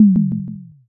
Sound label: tom